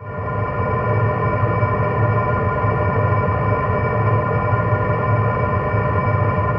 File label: DEEP MOSPH.; ATHMOSPHERE